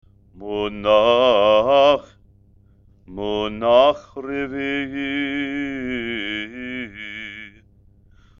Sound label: haftarah, trope